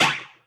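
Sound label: impact bullet generic titan shield; Titanfall 2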